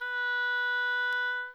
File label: oboe mf B4